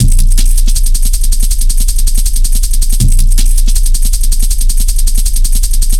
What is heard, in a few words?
160, Drum Loops, Drum & Bass; Total Drum&Bass